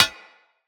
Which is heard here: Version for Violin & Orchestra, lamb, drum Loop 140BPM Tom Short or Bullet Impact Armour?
Bullet Impact Armour